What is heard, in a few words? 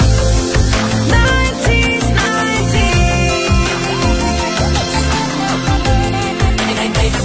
jingle